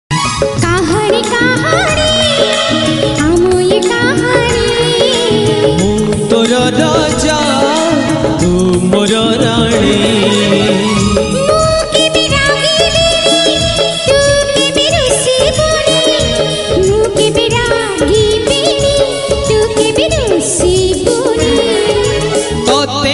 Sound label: Odia Jatra Song